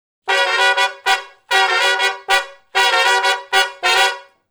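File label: Funk Riff (A) harmony, Horncraft for R&B Sections; Sonic Foundry (Sony Creative Software); Crimson Blue and Fabulous Horncraft 4 RnB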